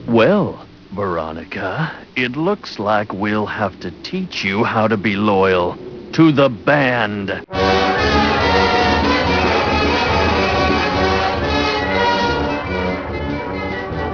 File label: band